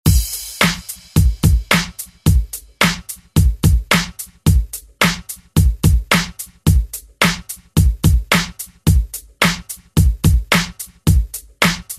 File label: 90s Re Drum